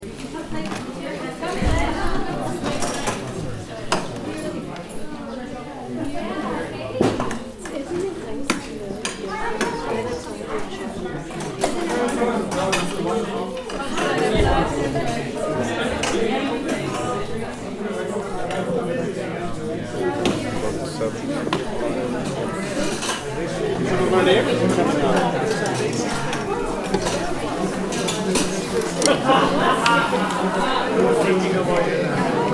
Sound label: Small Group Walla